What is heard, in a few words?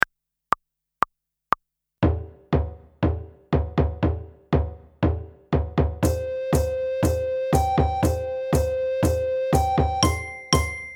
Group Piece without tabla